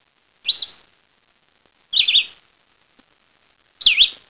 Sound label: Red-eyed Vireo